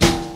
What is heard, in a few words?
warm snare e key